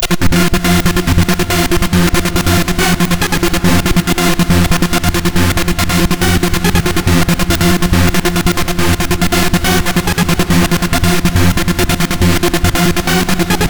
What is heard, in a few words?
Synthe Arp